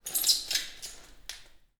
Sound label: Glass Scrape; 99Sounds; The Warehouse